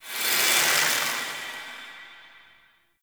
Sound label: Infested Hub Temple Door Open; Ambience; Props; Warframe; Infested Eris